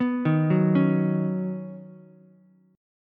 Eb Minor 7th Sharp 5th, down, Ukulele; arpeggiated; chord; JGuitar

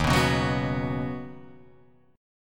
D sharp Minor 7th Sharp 5th; strummed; JGuitar

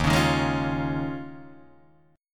D sharp 9th Suspended 4th D sharp, Guitar Drop D

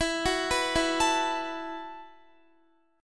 E Suspended 2nd Suspended 4th E, down, Guitar; Esus2sus4; arpeggiated

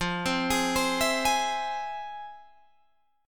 F Minor Major 7th, F, Guitar, Open G

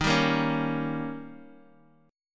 D sharp 7th D sharp, Guitar DADGAD

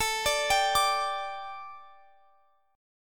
D Suspended 4th A

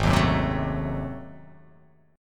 G sharp 7th Flat 9th G sharp down, chord

